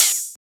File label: Open Hat; FLPRO